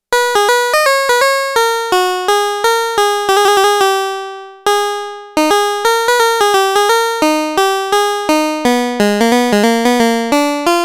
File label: HARPSICHORD, Roland SH-101; Factory Patches